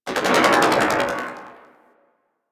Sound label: Electronic Gust; Ambience; Warframe; Corpus Railjack